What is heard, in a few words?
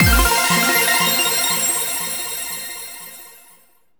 MAP SONG CLEAR; maimai